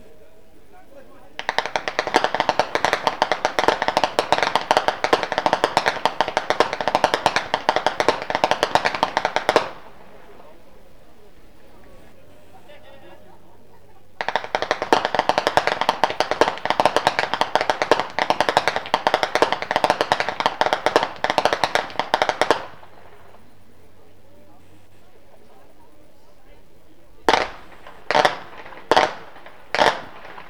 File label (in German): schnalzen, flachgau; anthering